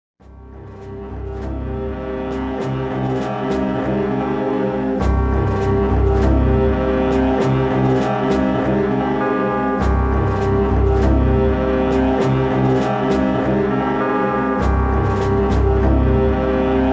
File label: air slow, music; Soldier Of Fortune II Double Helix; XBOX; airport